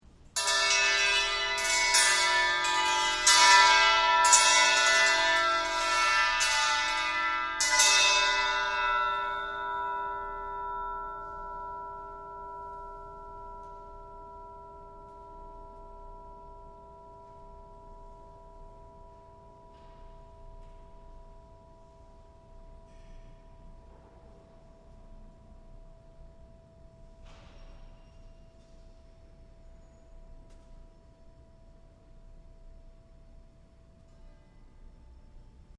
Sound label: bell crash